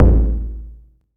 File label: Odd Kick Layer